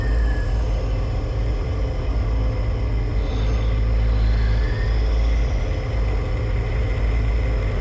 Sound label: Interference Machine; hidden deep windows gamerip 2022